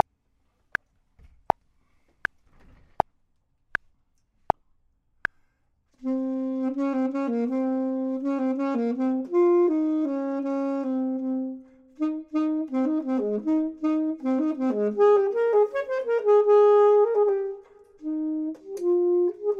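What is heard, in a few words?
bottom, Saxophone and Clarinet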